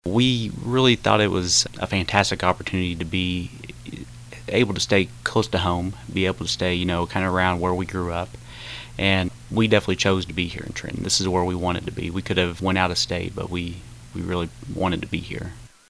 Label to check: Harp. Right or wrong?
Right